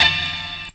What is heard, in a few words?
dirty hit, Melodic Stabs and Hits